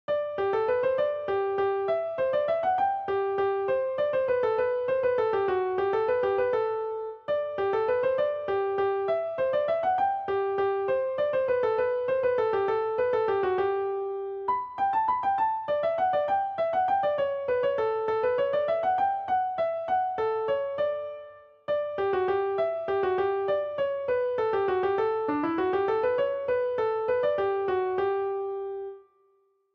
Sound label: Minuet Bach Piano something wrong; Maestro(Android application)